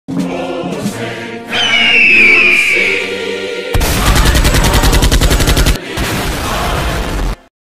usa anthem with gunshots explotion and bald eagle screach